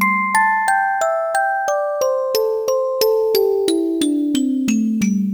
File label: Music Box; mallet